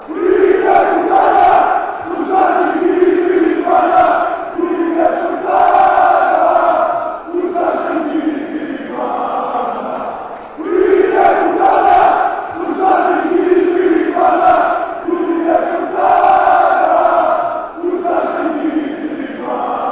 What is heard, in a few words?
olympiacos chant; ofc